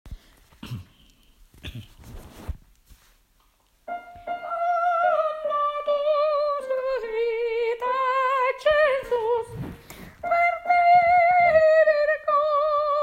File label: soprano; Stabat Mater 11